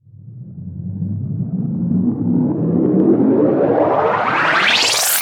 Sweeps Up, Effects

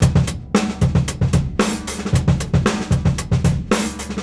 DRUM 113

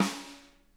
snare rim, drum kits; Sonic Reality; Interactive Drum Kits

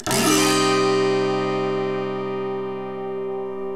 AUTOHARP; OMI - Universe of Sounds

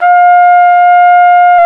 Solo Brass, Tpt p

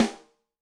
Brass Rim, Yamaha DTXpress-II snare

